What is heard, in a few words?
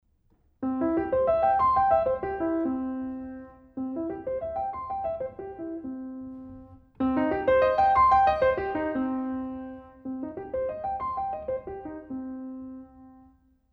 Two Octave Arpeggios RH